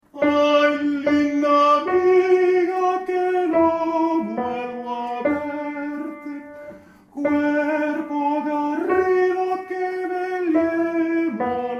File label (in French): Tenors; Ay linda amiga